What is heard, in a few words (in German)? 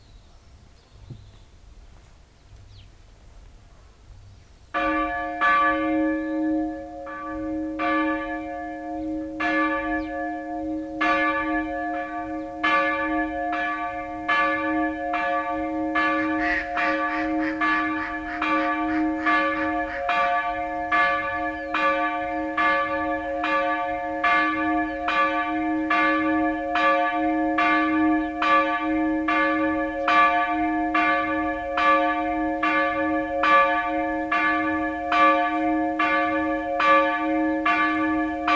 glocke; wegezin